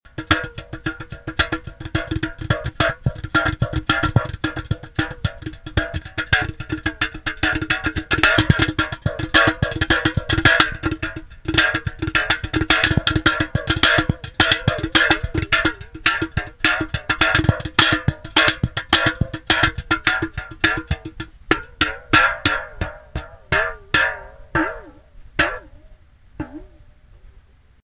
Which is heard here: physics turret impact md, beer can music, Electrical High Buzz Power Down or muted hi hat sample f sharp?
beer can music